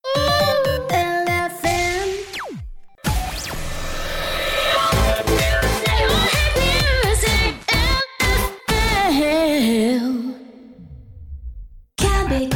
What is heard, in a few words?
Jingles